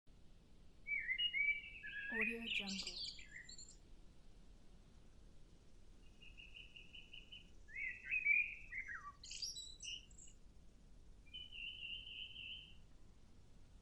birds singing in forest; dedgestudio

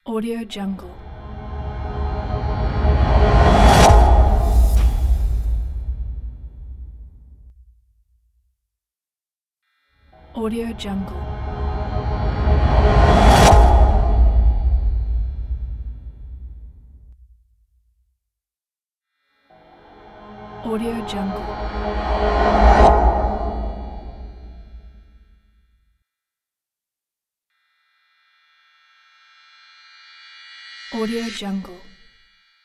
whooshes cinematic

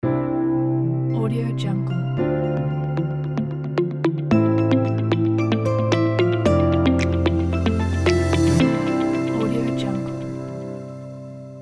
dream logo

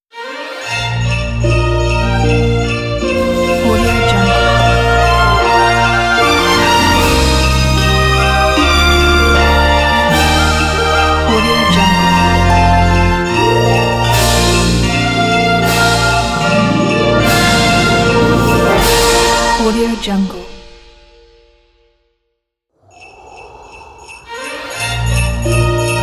merry christmas ident

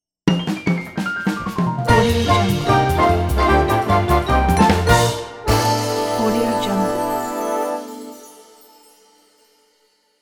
jazzy sitcom stinger transition

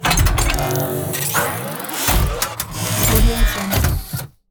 utilities mine or system activation

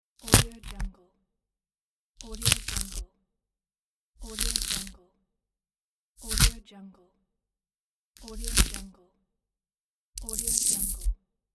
ripping flesh

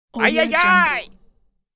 cartoon peasant pain shout

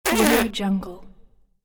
comedy mouth fart

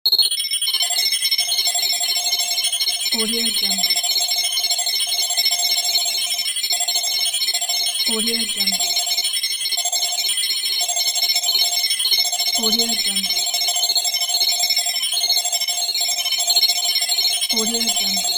digital data beeps; applehillstudios

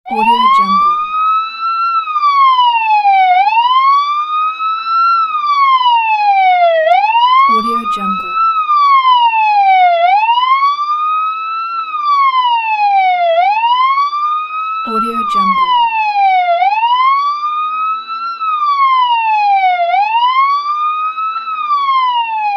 ambulance siren

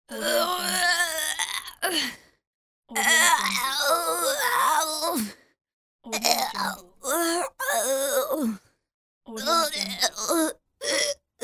teenage girl straining grunt pack

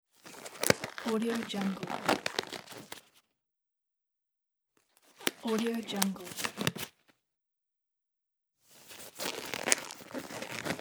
rubber gloves; mountainaudio

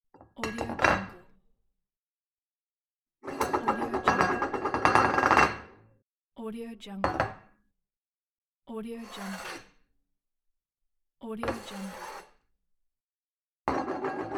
plate

